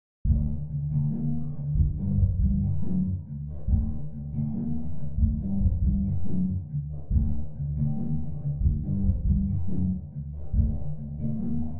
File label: nightclub outside music muffled d n b